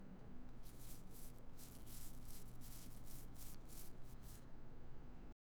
outdoor

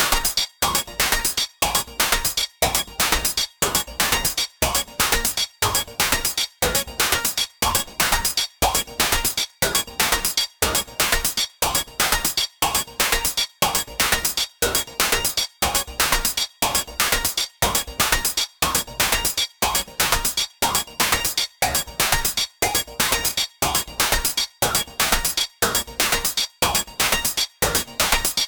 powerful psychic polyrhyt, abstract

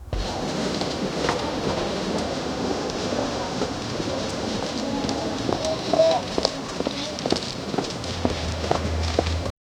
ambient noise from travel

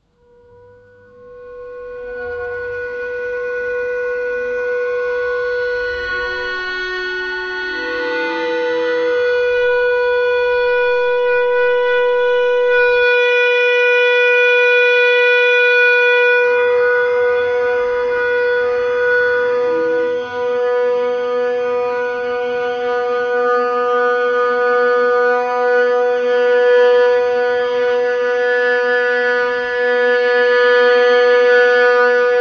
oboe sound slowly modulat; wind instrument

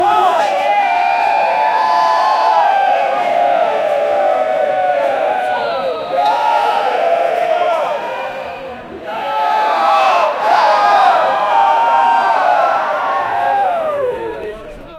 arena crowd yelling

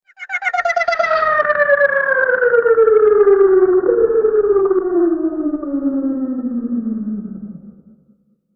a psychic pitched down, slowed

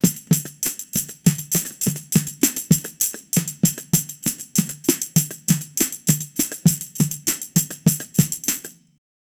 upbeat hand percussion rh, rhythm

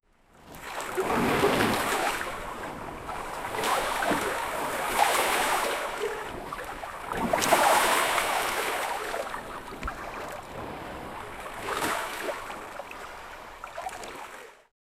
person swimming in lake; aquatic

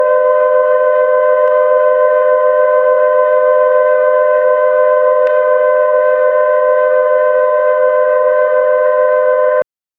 magic fantasy siren made

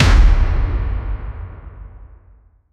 impact, body fall; sound effect

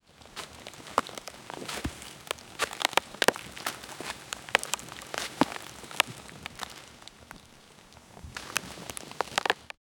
forest braches rarely cra, crack

